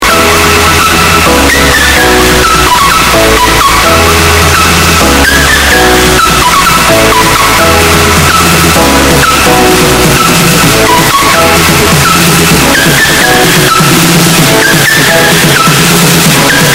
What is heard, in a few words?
earrape